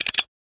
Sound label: Gun Lock; FREE SFX PACK VOL 1